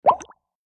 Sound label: Bubble Pop Wet